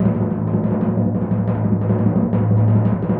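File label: timpani A#, Synclavier Percussion; ILIO; Synclavier Percussion 2; World & Orchestral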